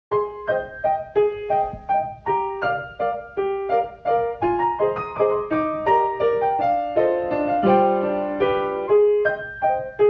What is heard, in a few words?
piano shop; DELTARUNE Chapters 3+4; kris